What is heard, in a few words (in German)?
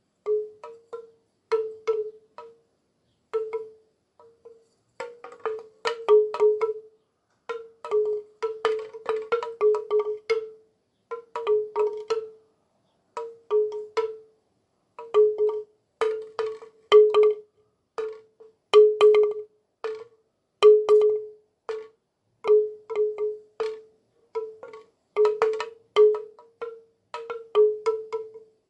bambus windspiel gross